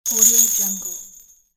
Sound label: magic wand spell